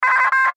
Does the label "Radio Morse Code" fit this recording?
Yes